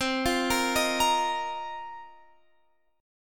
A sharp Suspended 2nd Suspended 4th C

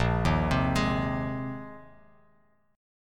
Bb 9th Suspended 4th Bb down Bass Standard; arpeggiated; JGuitar

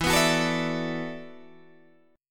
E 9th Suspended 4th E down Guitar Open D

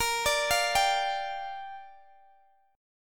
A sharp 6th, Guitar Open G

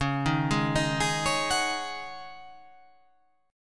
C sharp Suspended 2nd Suspended 4th, C sharp

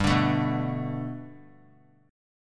G Major Add 9th chord; Gadd9 strummed; JGuitar

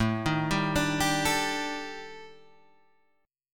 Eb Major 7th Flat 5th A, guitar

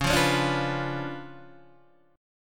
Db 7th Flat 9th, Guitar, Open D

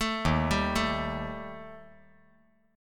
Eb Major Flat 5th Eb

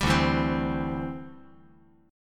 D 9th Suspended 4th D down

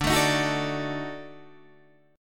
Eb Minor Major 7th Flat 5th; EbmM7b5/D strummed

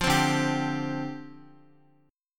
D Minor 11th D, Guitar; Dm11 strummed; JGuitar

